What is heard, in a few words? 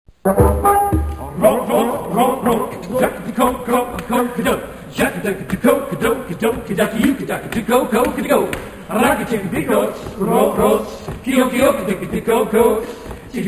rock etude fast